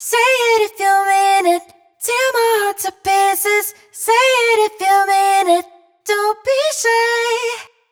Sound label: hook 120 G wet, Vocals; Say It; Slate Digital Omnivox Vocal Sample Pack